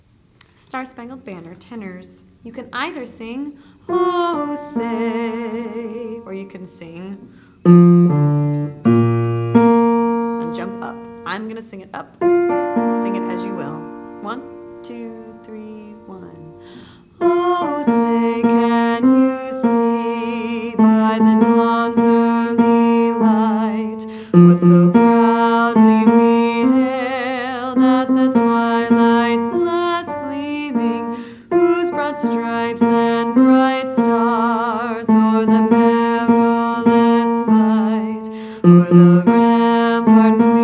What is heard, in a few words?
Tenor with voice; Rosemont Ridge Middle